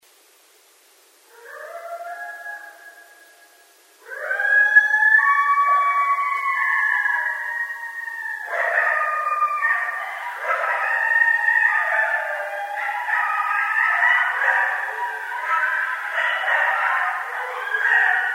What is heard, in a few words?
Coyotes; Canis latrans